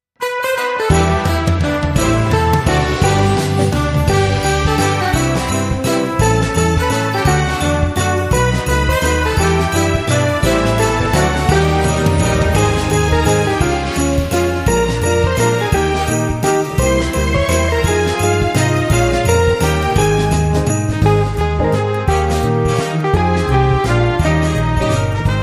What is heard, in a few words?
We Wish You a Merry Christmas (jazz classic) (High)